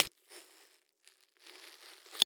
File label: Horror Scary Violence Body Being Stabbed And Knife Pulled Out